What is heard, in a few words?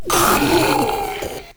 Proto Infested Ancient Vocal Pain; Warframe